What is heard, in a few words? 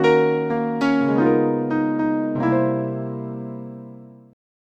Piano, Acoustic Pianos